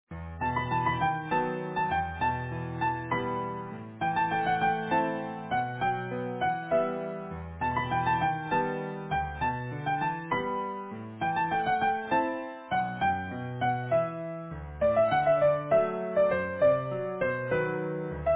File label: Easy Piano